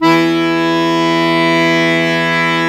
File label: Accordians, Accord Duo; Club-50 - Foundations Roland